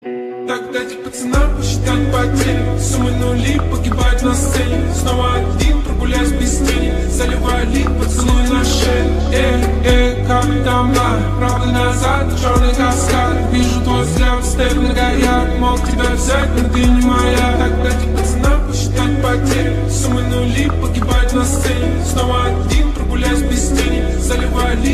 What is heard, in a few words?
Russian Remix Ringtone